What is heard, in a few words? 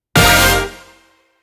medium win; netent